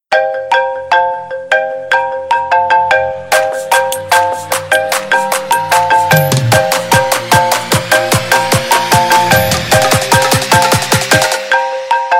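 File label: future bass xylophone iphone ringtone remix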